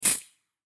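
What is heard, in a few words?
sounds of hairspray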